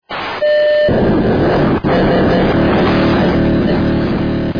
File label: Pocsac